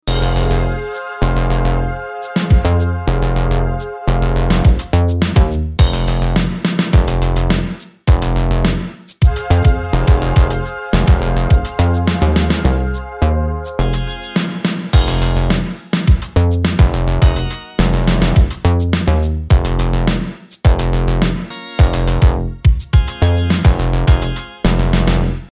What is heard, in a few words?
bass breakdown highlight synthwave 80s dark retro synth